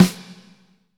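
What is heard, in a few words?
snare, ballad, Roland; Northstar - Drumscapes Roland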